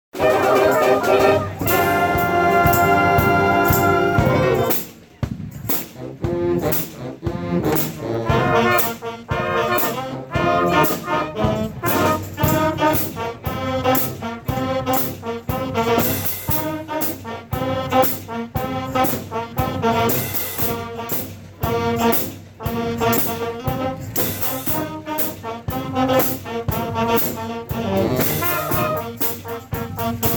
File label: Band